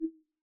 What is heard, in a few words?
focus change large, iOS Sounds